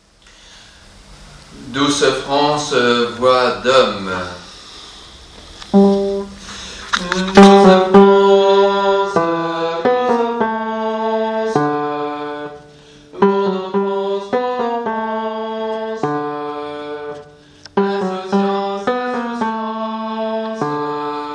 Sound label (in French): refrain hommes